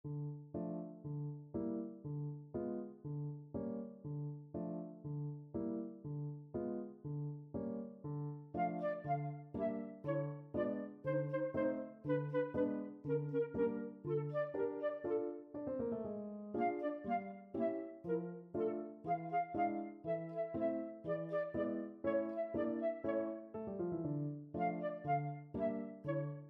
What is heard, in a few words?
sugar plum, flute clarinet duet; tchaikovksy